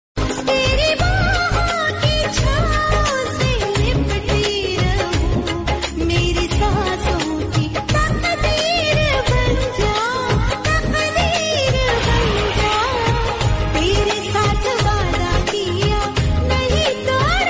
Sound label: hindi song